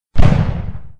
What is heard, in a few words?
rl impact